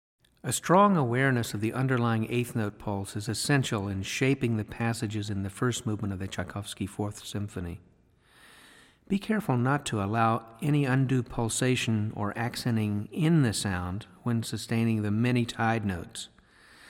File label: Symphony In F Minor, Clarinet